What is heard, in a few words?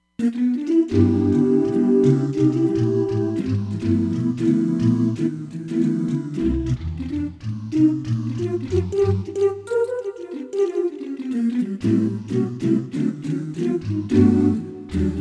Jazz Doos, Roland Fantom XR